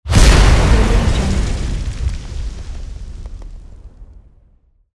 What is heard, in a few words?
explosion